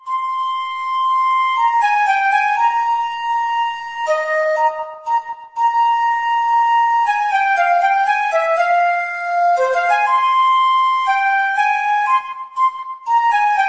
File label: flute ringtone; sailormoon